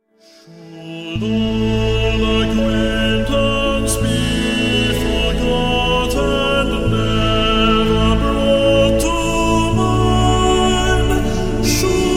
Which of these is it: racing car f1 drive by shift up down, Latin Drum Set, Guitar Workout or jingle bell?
jingle bell